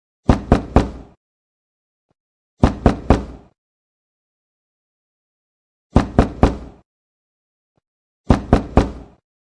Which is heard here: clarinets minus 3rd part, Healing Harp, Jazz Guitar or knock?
knock